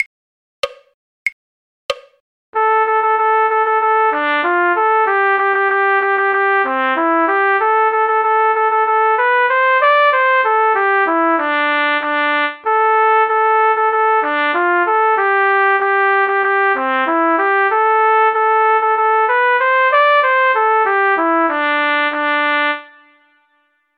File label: trumpet; Drunken Sailor; folk